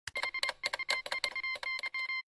Telegram; HOI4

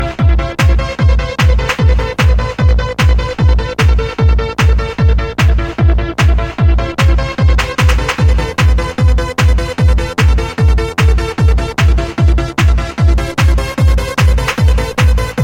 Trance

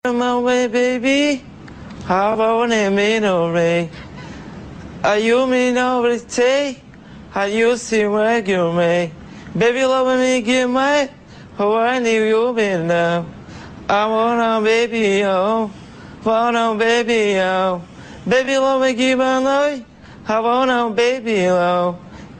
Music Funny

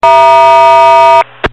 Tone Up

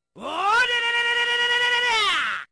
Punch; Vulcan

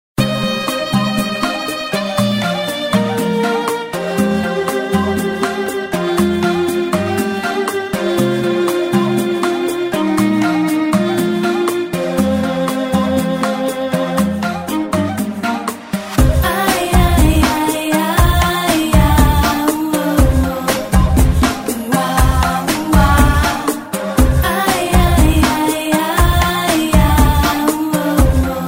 Afro-latin

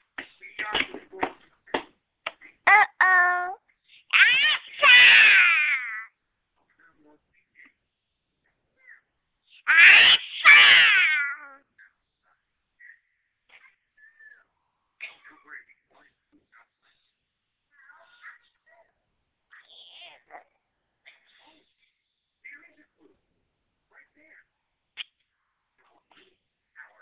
Fake Sneeze

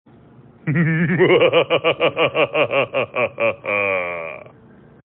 Muahaha

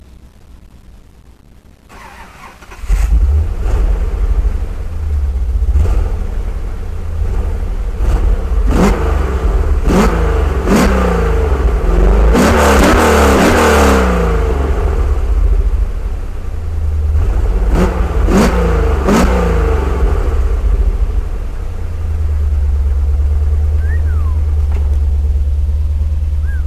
Chevy V8 Engine

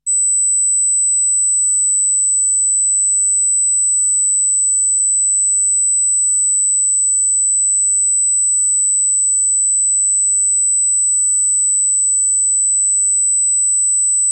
Screech

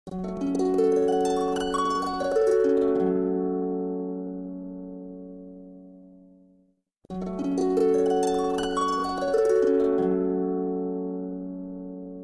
Harp

